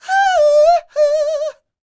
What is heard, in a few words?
Vocal Planet R&B & Dance; ILIO